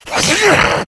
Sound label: rage, infected, male